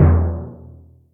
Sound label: Timp L, Percussion